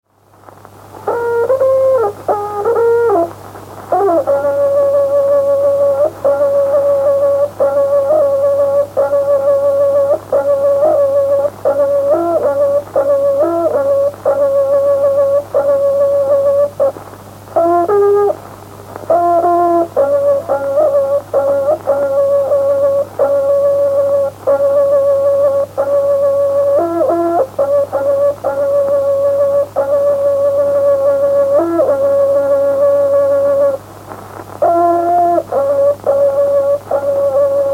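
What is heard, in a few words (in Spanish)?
Toque de trutruka, Mapuche; Puede ser en Boroa, Collico o Dollinco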